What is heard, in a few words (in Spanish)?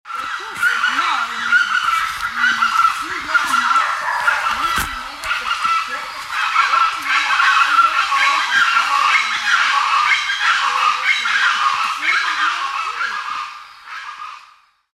Graznidos de chachalacas